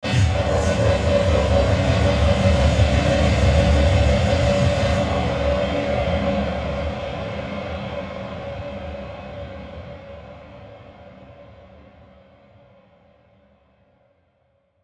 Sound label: Hit Scrapes Vibrato Metallic; Blastwave